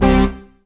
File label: SYN SYNTH, dance synth; Sound & Vision - Gigapack I CD 2